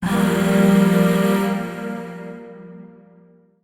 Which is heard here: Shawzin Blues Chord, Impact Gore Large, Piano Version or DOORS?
Shawzin Blues Chord